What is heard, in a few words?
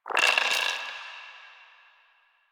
Roll Dice; Warframe; UI; Cetus Vendors